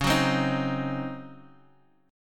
Db Major 7th Suspended 2nd Suspended 4th Db down Guitar Open F; DbM7sus2sus4; strummed